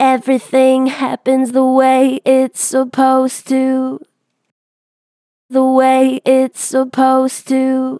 Vib Everything happens the way, Vocals, Natural Vibrato Strong; Harmor Vocals